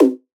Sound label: Fuzzy Perc; House; Drum One Shots; Percussion